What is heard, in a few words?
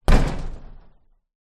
impact metal fence